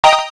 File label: digital star, keytone; SKY IM-A600S Sirius